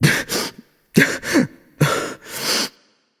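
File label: boohoo, non-verbal; voicepack; smite; merlin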